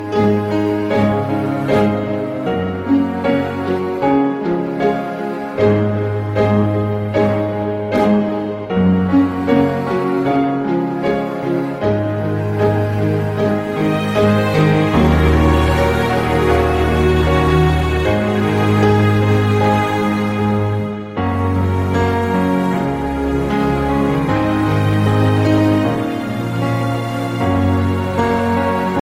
i phone x acoustic instrumental ringtone

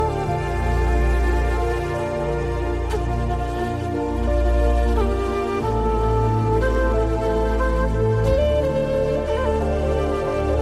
i phone 6s beautiful native ringtone

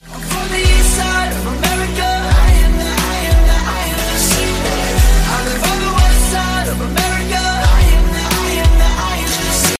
iphone 7 plus remix ringtone

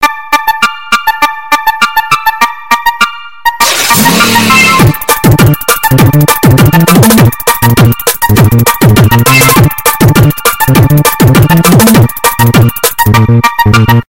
tone ringtone; iphone 3g

